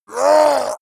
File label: yeti shout short pain